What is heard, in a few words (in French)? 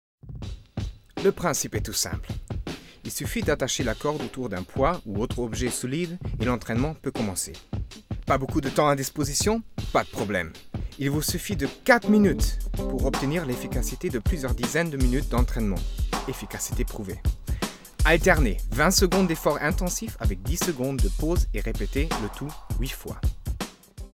cordes